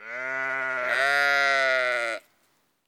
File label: Sheep